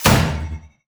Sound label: shield bash; CrossCode; battle